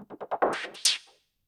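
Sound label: SNARE ELECTRO 140, Techno Drum; Techno2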